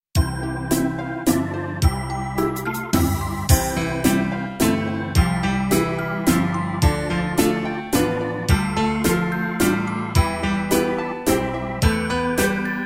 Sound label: waltz